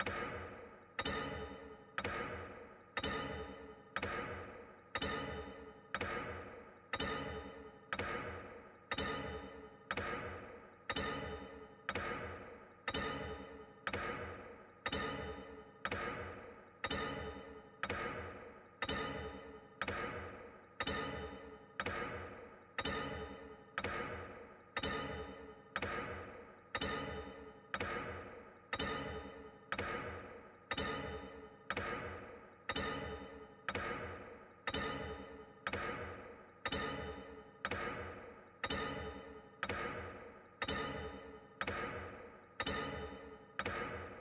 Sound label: tictac loop church